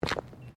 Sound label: Footsteps Boots Plastic Ground Mat Step; Footstep Foley; Footsteps (Sound Effects:Human)